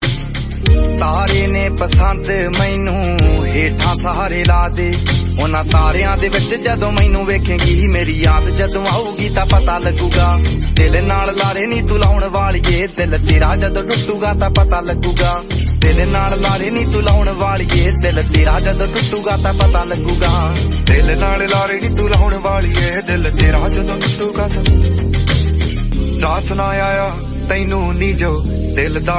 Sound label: Punjabi Song Ringtone